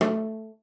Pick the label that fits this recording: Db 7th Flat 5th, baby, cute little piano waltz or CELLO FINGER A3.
CELLO FINGER A3